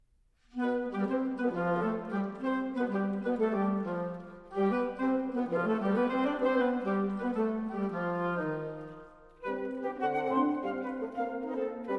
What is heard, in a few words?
Woodwind Quintet, Fast And mercurial